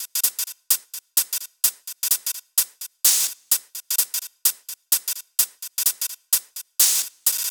128BPM Kit; Vengeance Samples; VFH1 128BPM Australian Kit

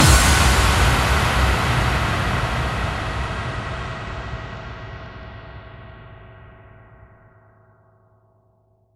Bassdrums FX, FX Kicks; Vengeance Samples

